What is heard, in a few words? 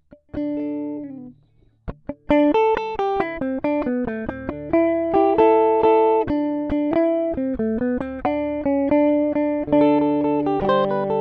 Gothic guitar